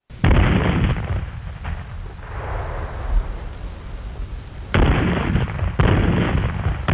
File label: Cannon Volley; Napoleon